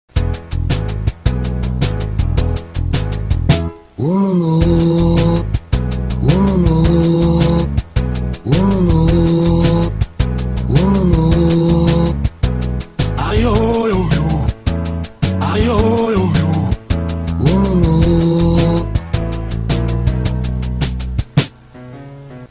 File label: blues; Wololo